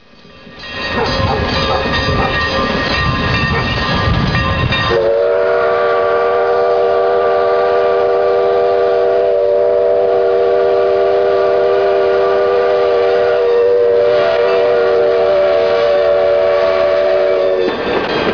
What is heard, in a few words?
Steam; cwrr